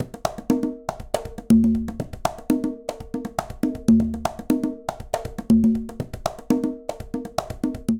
Jazz Funk B Conga Tumba 120bpm; Latin Percussion; Ableton; Factory Packs

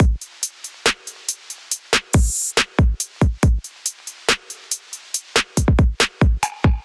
Kit 70 bpm, Trap Drums; Traverse Kit

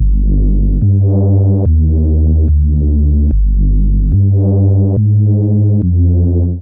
Rage Sub G Minor 145 bpm; MIDI Clips; Ableton; Factory Packs; Skitter and Step

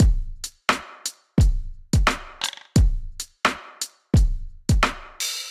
MPE Speaking Kit 87 bpm, hip-hop drums; Golden Era; MIDI Clips; Sound Oracle

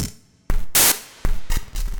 IDM Perc, IDM Percussion

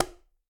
Bongo Slap; Bongo A H Slap; Latin Percussion; Drums; Drum Hits; Ableton; Factory Packs

